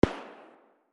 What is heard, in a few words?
distant sound, fiveseven; garrysmod